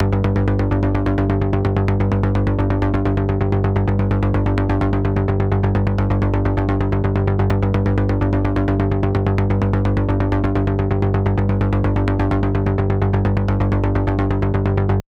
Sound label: Freeze Polysix, bass